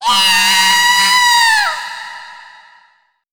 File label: gyaaaa; 女; 悲鳴３ ぃああぁぁぁ〜!!!